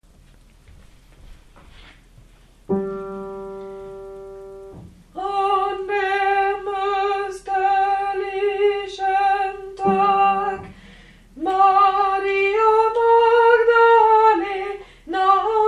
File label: basses